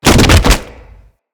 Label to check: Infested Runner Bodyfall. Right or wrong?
Right